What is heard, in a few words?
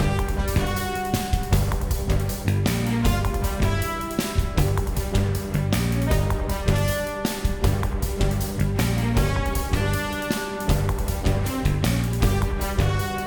Bee Hive Platforming MIXED